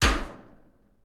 Cage Closed; Game rip; MiSide

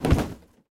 Box Jump; Game rip; MiSide

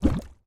Step Lava; Game rip; MiSide

